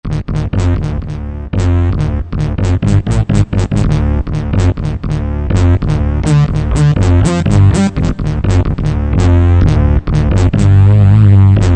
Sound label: Pump Bass, Roland SR-JV80-19 House Collection